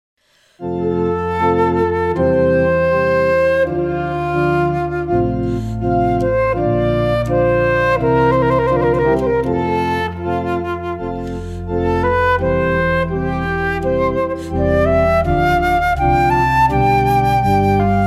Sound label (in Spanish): Instrumental para desfile; musica para bodas